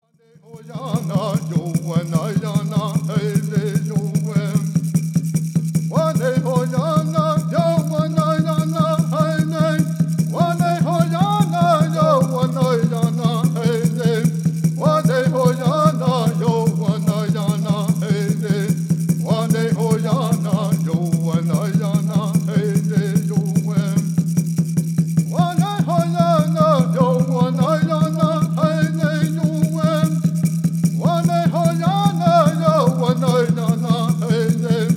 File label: Peyote Song